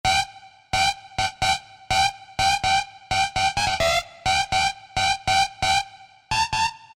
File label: Rave, Yamaha DJX Voices